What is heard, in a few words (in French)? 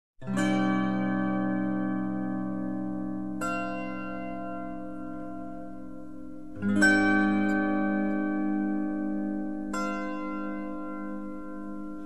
matin laudes prélude instrumental